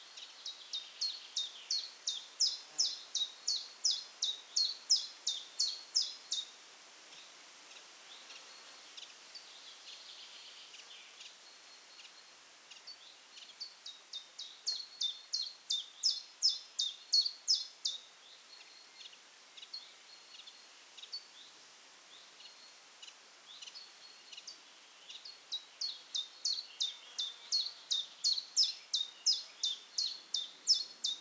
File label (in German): Vogelstimme Zilpzalp, Common Chiffchaff, Phylloscopus collybita; Vogelstimmen